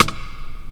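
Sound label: 127 drum 2 R, Fresh Disco House; USB Soundscan vol.20; AKAI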